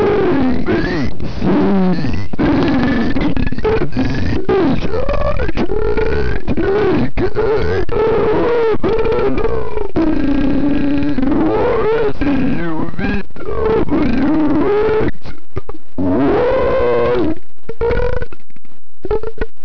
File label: belch